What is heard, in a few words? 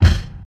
trebly bass drum e key, Royality free kick sound tuned to the E note. Loudest frequency: 525Hz; kick drum samples